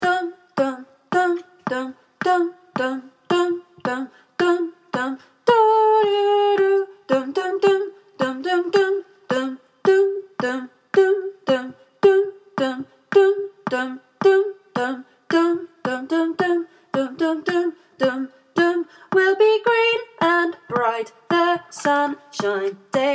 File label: bass; brightonswingchoir